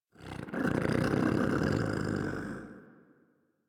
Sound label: Catbrow Combat Vocal Idle; Warframe